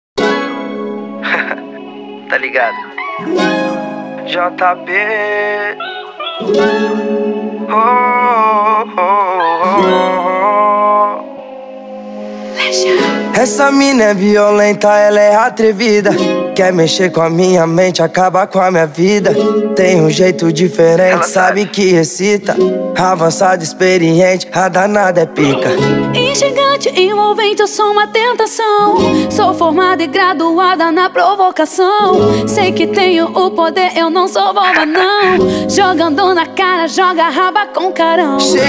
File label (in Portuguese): funk e sertanejo